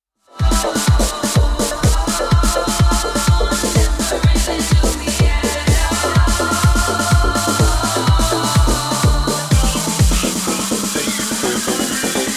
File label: big beat majestic remix